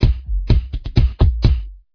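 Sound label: kick, drums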